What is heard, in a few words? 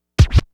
Scratch Hit; Beat Components